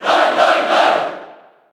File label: Cheer JP; Roy Koopa; SSB4